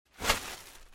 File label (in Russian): Взятие мешка